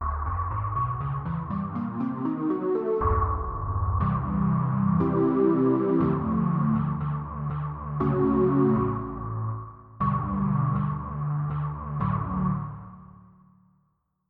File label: P5 Fightr WahSlo, synth